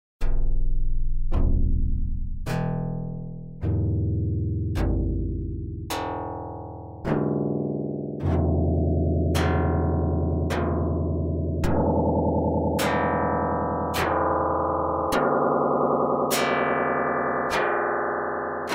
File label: Lyre Electric